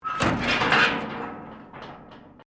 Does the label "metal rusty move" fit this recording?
Yes